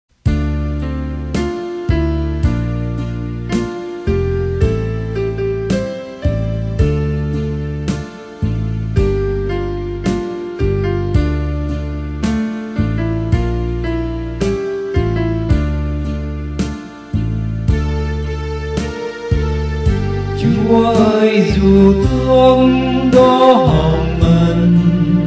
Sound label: BASS